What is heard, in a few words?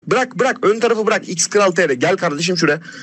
xkraltrrrrr